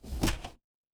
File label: door open